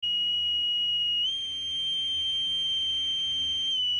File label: us navys bosun whistle notification